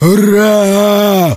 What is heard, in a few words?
zombie attack; monsters